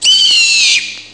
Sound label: skrook; ST-XX Amiga Soundtracker Sample Packs